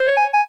clarinet; keytone; SKY; IM-A850S VEGA R3

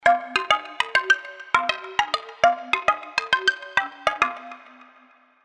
Tumbling Ringtone, Hollow; HTC

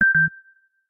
Beep Beep, ringtones; Samsung Galaxy S24

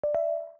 Message Send Out; Xiaomi MIUI-9

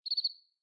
Bird Cicada Notification Evening; Xiaomi Redmi-8A

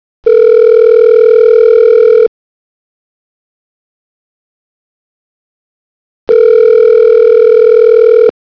ringback; Asus P835

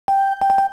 Movie Stop, Camera

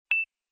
Pop up; LG GB255g; Event sounds

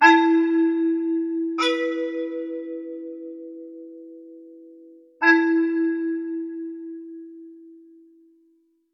alarms; HTC; One-M7

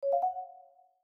lens select; LG Wing 5G Verizon

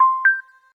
Full Battery, phonetones, LG; LG Wine Smart F480K

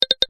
Tap, notifications; Itel S25 Ultra

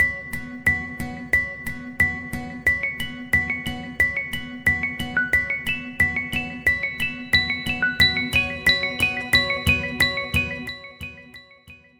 Glass Raindrops; Samsung; Galaxy M20

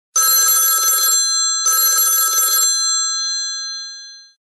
Classic Bell; Samsung SGH-D788

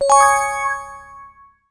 alarm positive; HTC Touch Diamond

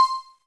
movie start; NEC Casio MEDIAS-ES-N-05D

